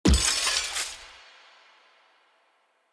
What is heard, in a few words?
Passive Armour Shatter; Powers; Bromius